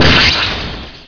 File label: fire misl; Hexen2; skullwiz